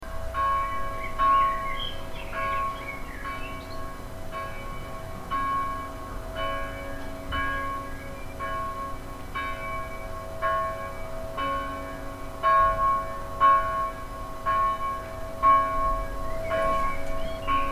church bells and birds

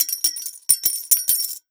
coins; moneyTree

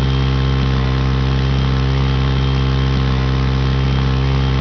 engine 6000; xmoto

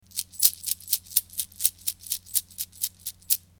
shaking coins in palm

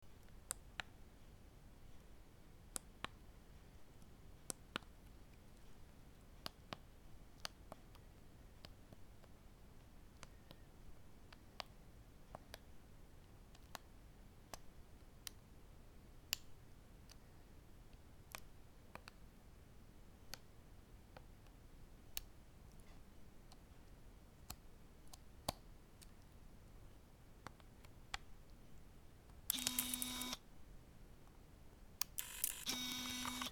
Canon Digital IXUS 55 mute

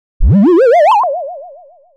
comic doop

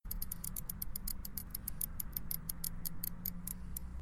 wheel Spin

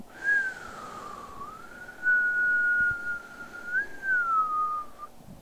soft whistle

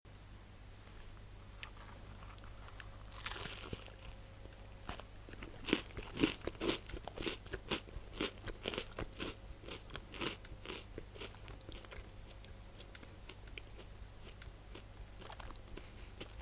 eating pizza

